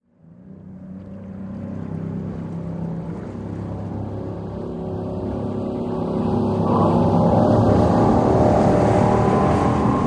Military Pbr: Circling Offshore Medium Close-up, Fast Speed. Wake Laps On Shore. Stereo